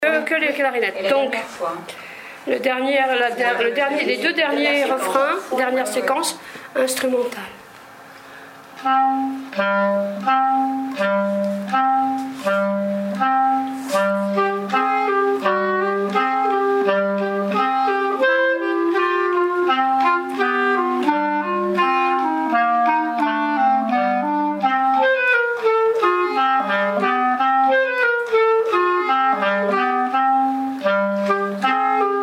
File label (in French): clarinettes; Funambule derniere partie